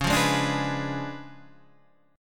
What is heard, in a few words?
Db 7th Flat 9th, Guitar, Standard; Db7b9 strummed; JGuitar